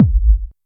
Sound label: BD SUB SNK, Yamaha DTXpress